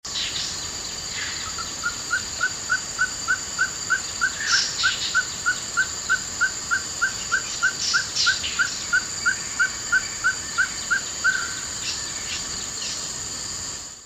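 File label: kalophrynus limbooliati, Lim's Black-spotted Sticky Frog call; Sime Forest, Singapore; Microhylidae; Sime Forest, Singapore, 10 M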